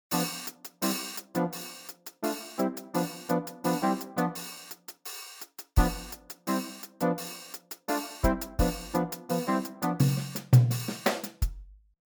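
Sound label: impact, rocks; Ultra Analog Session; PolySynth PolyChorus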